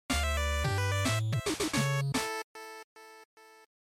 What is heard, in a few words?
Lose; GB; Sports